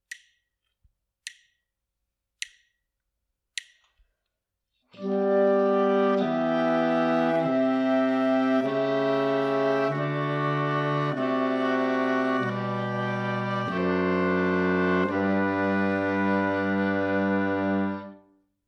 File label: harmonic dictation